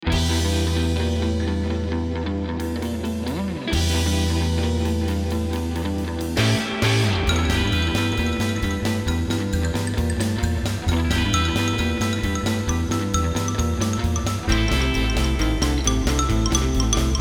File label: Transition; 3 Stooges